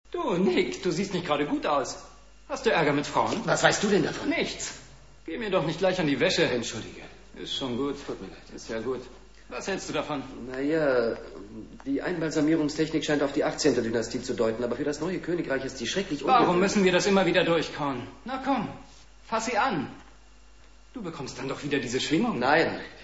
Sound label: vibes